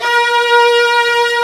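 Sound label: violin, string orch; Roland LCDP13 String Sections; STR Combos 2; CMB StringOrch 2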